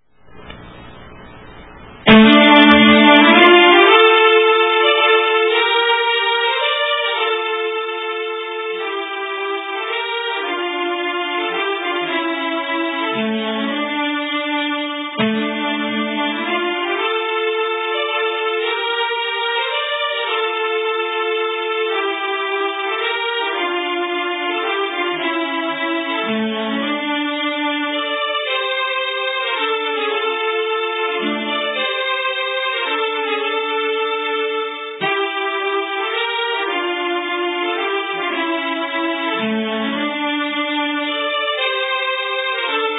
Polska; H Falk

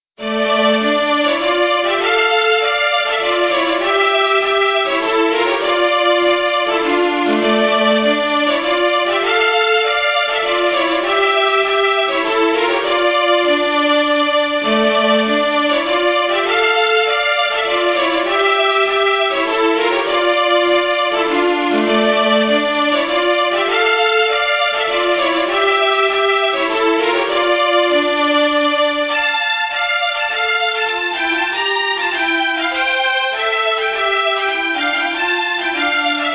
polska; Spel-Gulles polska

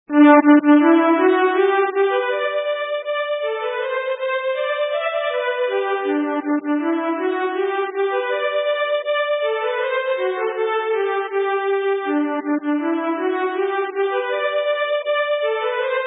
Schottis; efter Emil Andersson

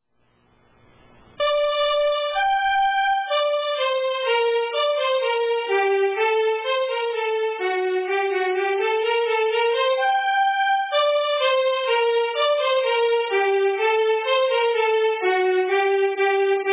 schottis; Paaskaschottis

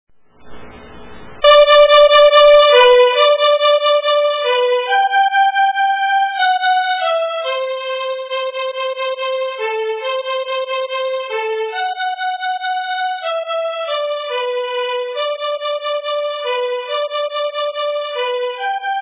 polkan; Kinnaredspolkan

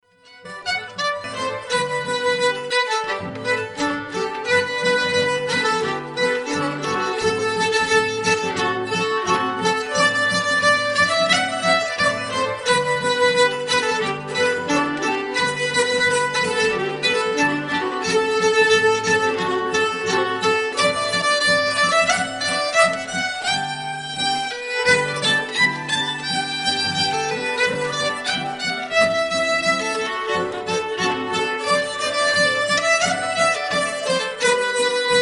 polkan G 88bpm; Bladinge

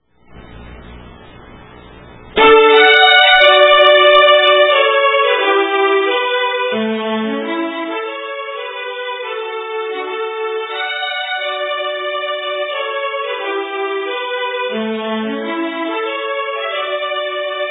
Polska

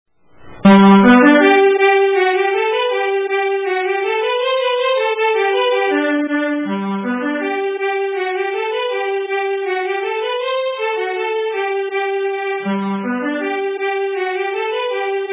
Slaep

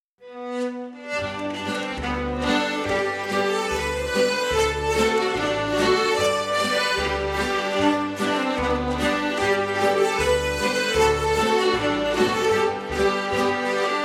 Schottis G

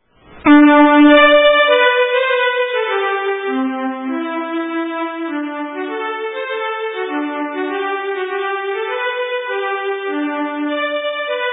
schottis; Bingsjoeschottis

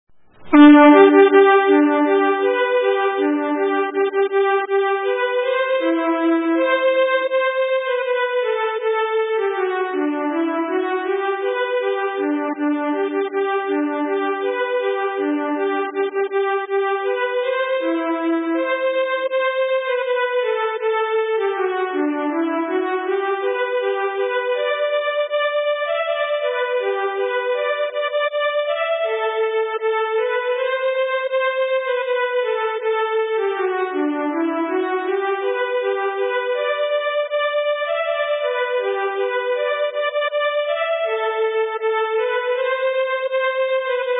Polketta; f Alingsaas

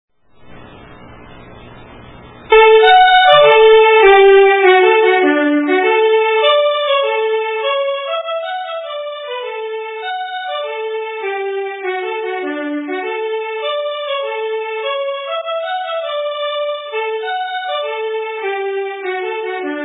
Polska

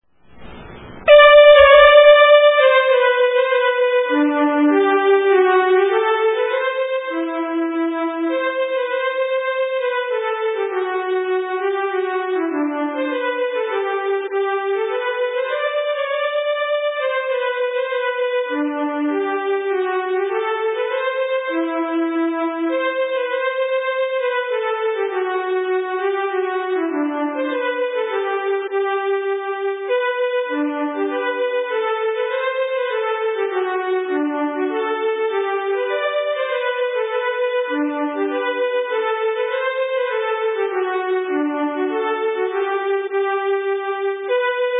mazurka

